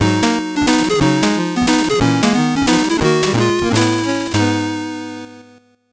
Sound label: 8bit jingle normal